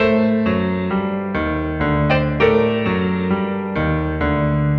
Hardboiled Piano, 100bpm; Loops n Lines

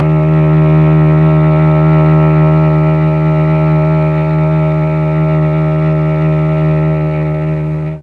D#2 TRON CELLO; Mike Pinder - Mellotron Archive CD

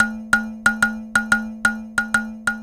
Kalimba; Cinematic Funk; Sonic Foundry (Sony Creative Software); Cinematic Funk Urban Tone Poems

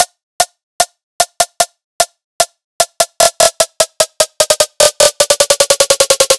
150BPM Long Snare Roll; Antidote Advent; Infected Track Drums Ableton Project

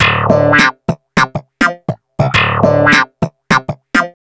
Wah Snap in D, Snap Bass; Basses

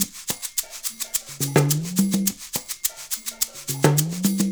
percussion loop; InVision Interactive; Percussion Slam; ZOMBIE

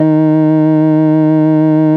FARF, FARFISA

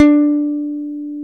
D-4 pick, pick bass; AKAI S-Series CD-ROM Sound Library VOL-7

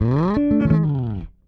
Slide D, Bass; Bass X

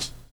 OLD LP, Kitch 70s; Univers Sons

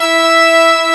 FM ORGAN; FMA ORGAN; ORG MIX

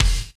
BD, 170 BPM, Drum & Bass; Ueberschall; Drum & Bass Flash Trackz

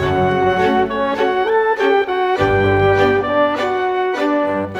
Orchestra, Rock Pop

